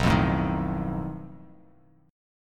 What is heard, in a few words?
B 7th Flat 5th B, chord; B7b5 strummed; JGuitar